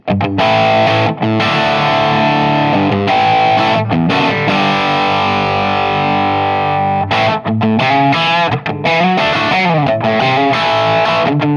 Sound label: Dirty Tele bridge pu Humbugging Modern Crunch channel gain 9 treble 13; Joe Barden